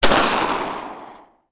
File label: GUN, crime; zones